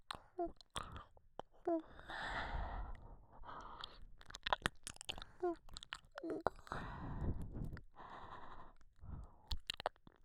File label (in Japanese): れりゅぅ…れちゅっ…, ASMR